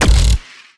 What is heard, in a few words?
energy shot